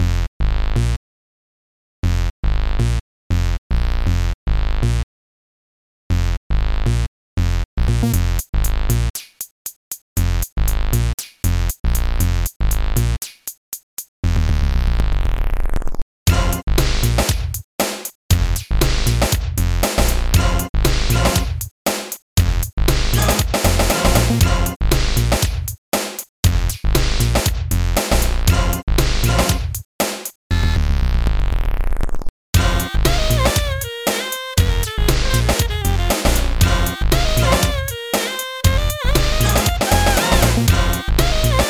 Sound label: stomp swing